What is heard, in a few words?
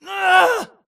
grunt death quick; Titanfall 2